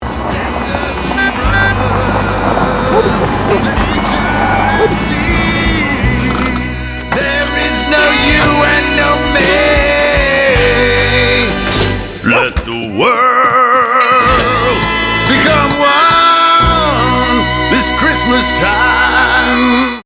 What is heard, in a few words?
singing commercial, voiceover; ASAdventure